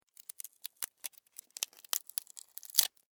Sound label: Insect Termite Bite Wood Splinter; Termites; Animals:Insects